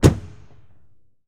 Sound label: Lamborghini Door Close, Automobiles